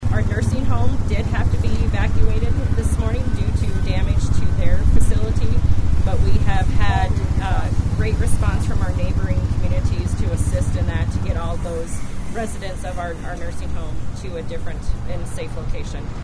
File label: high wind; storms; city of highmore; mondays storms